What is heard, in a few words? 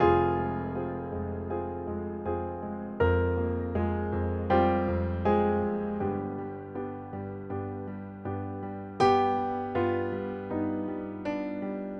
Emotional Piano